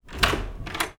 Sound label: door open